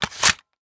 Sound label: lr300 buttstock swingback; Rust